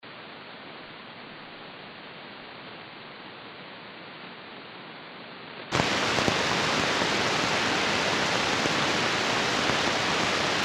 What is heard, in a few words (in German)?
Rauschen auf freier Frequenz